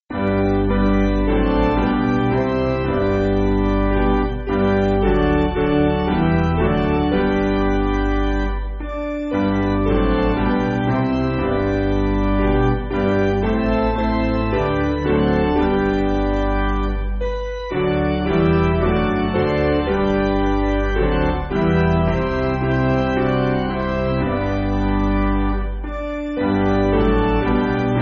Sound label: Hymnary Piano